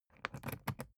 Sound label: briefcase plastic latch lock key insert